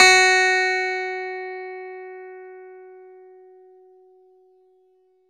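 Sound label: clavinet; Roland LCDP10 Keys of the 60s and 70s 2; Clav DB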